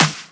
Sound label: Snare clap